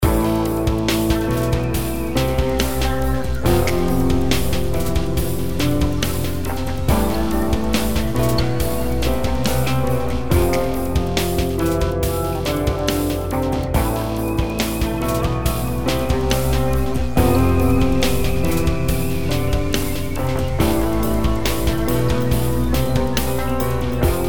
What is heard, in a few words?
piano